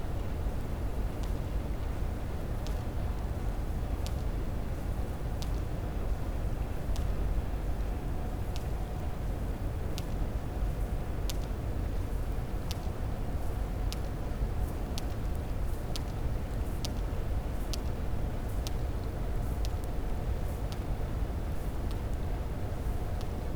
cachalot positif, Physeter Macrocephalus

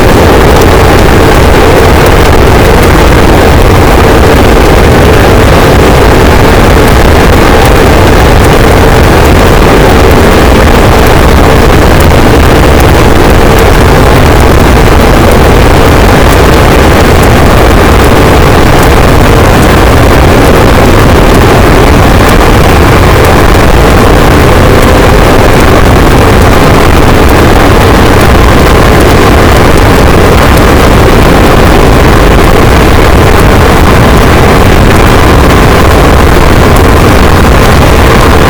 cachalot positif, boat; Physeter Macrocephalus

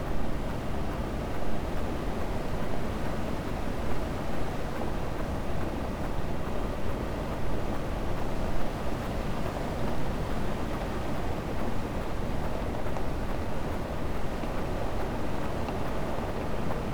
cachalot negatif, Physeter Macrocephalus

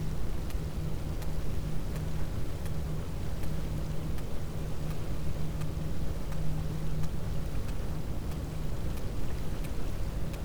cachalot positif, Physeter Macrocephalus

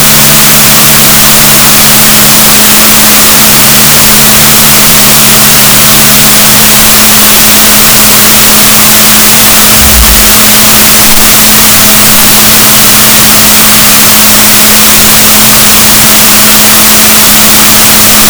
rorquals positif, gaussian noise, Balaenoptera Physalus Chile

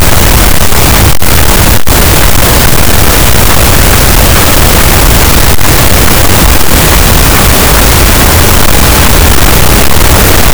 cachalot, gaussian noise; Physeter Macrocephalus

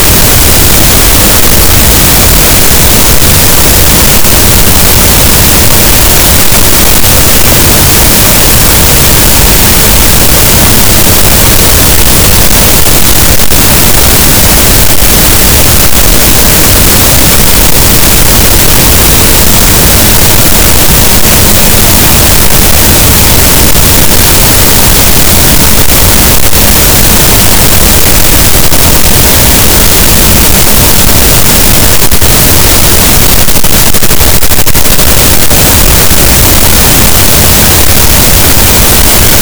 cachalot, Physeter Macrocephalus, gaussian noise

